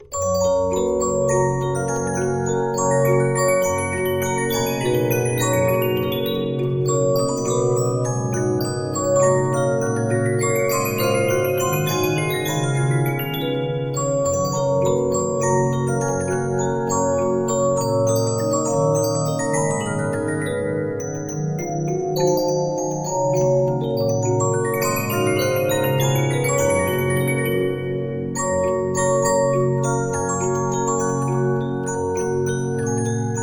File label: Regina, 15inch disc musicbox